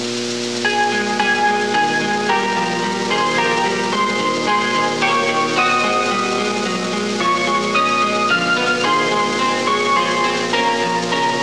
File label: chime, kintetsu; 布施